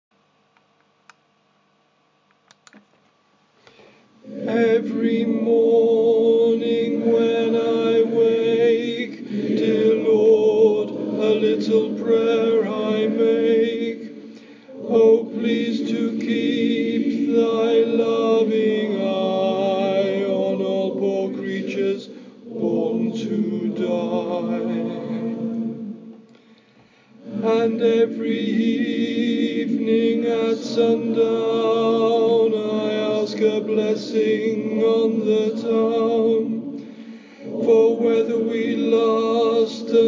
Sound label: Evening Prayer singing over; African Trilogy